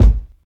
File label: kick drum sample g key